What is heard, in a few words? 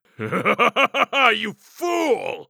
Knight Resurrect